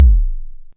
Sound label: Simmons SDS8 bd03; drum machines